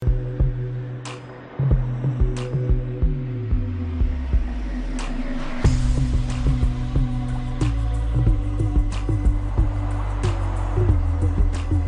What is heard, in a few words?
new iphone 6s ringtone